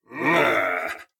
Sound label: Combat; Eshimal; SyrinScape; Snarl and Oraculum